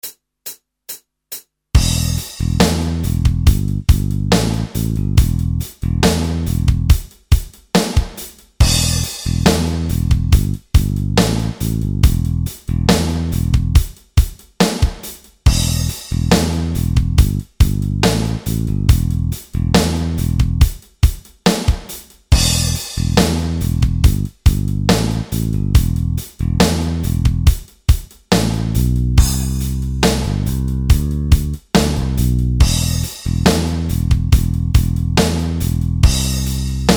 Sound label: Ruff Riff Backing